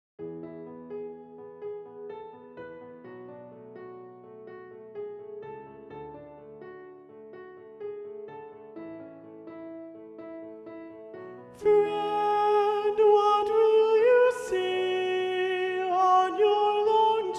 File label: satb soprano predominant